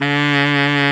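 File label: big band fast baritone sax; Roland L-CDC-01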